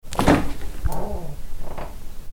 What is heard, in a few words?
Refrigerator Door Open